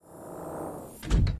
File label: top door close, tardis; p00gie